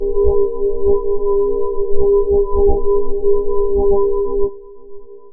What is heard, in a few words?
harmonics; Scars FNC SFX